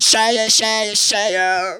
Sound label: "Cheychey", Funky Vocals; USB Soundscan vol.18; TB MELODY